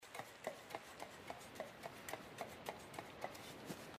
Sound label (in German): klettern; inside